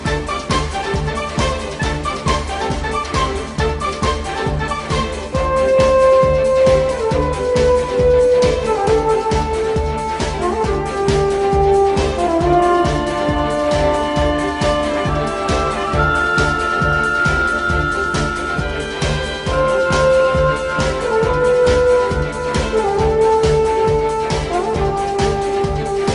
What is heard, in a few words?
Orchestra